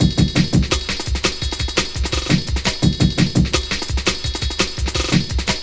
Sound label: Break, Drum & Bass, Beats & Breaks; Sonic Foundry (Sony Creative Software) - Fluid Dynamics Drum & Bass